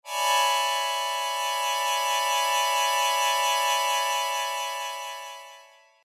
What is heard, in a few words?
Sho